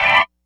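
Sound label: sample stab, Hardcore Breakbeat 158 A minor; Big Fish Audio - Hardcore Breakbeat Action; Hardcore Breakbeat Action CD1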